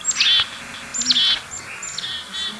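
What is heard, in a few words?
chickadee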